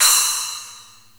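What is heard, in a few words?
SPLASH, cymbal; 300 Drum Machines; Kit B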